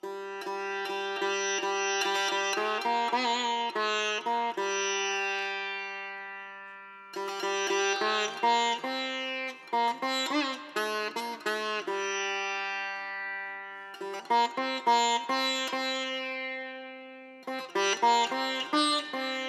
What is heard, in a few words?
Sitar solo; raji an ancient epic